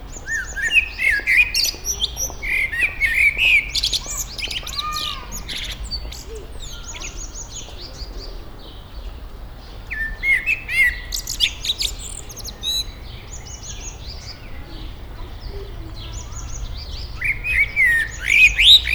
feketerigó; állathangok; nyíregyházi zoo 2010

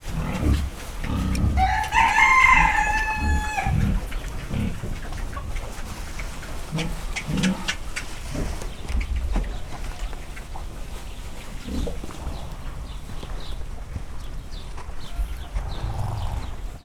kakas disznóól; parasztudvar

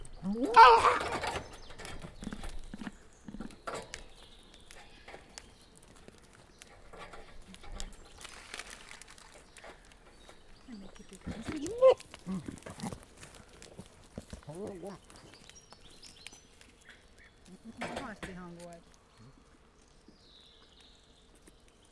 eledelét védő kecske, házi kecske; felsolajos zoo 2015; cardioid

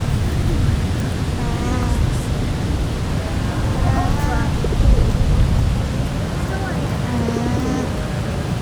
rövid szél, láma; Győri Zoo 2014

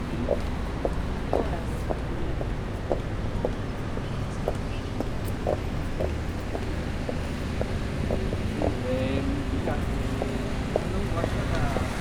amikor nagyanoi csizma

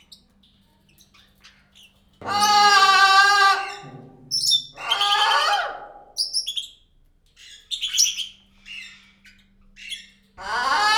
mondja a magáét, kék-sárga ara; pecsi zoo 2017

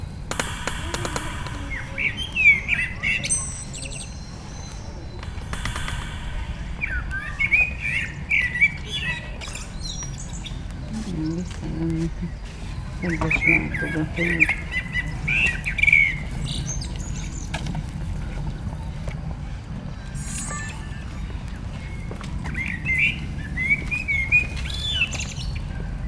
kerítésnél egy madár, fekete rigó; allathangok; veszpremizoo 2013; akg

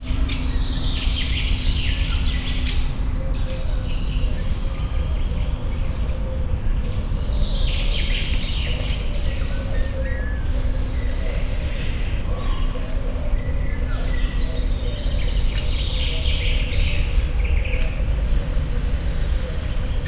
erdő hangulat; szegedi vadaspark; szegedi zoo 2011

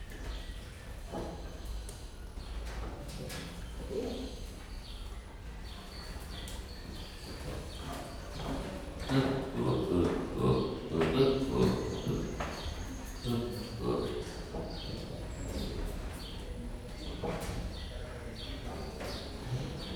a végefelé felbátorodnak kissé, gallérospávián; gyori zoo 2014

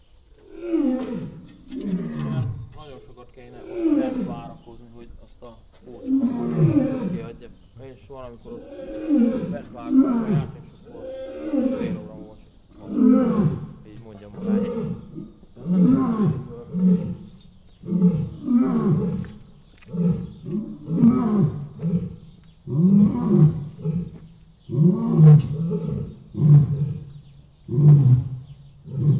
az elején beszélgetés, oroszlán; marantz puska; debreceni zoo 2012

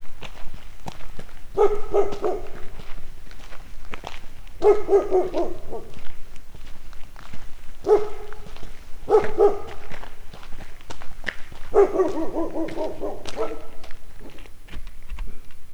közeledő lépések, kutya; miskolci zoo 2009

